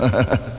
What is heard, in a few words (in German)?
lachen; heiteres Geräusch; aus meinem Mund